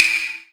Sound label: normal hit whistle; sweeb funny mix